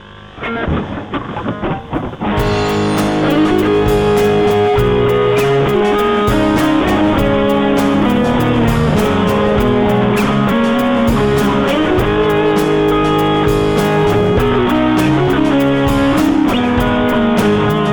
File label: amp